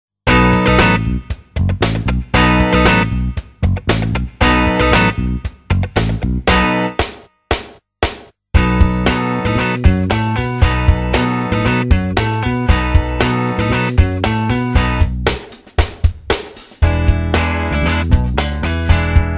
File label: base musical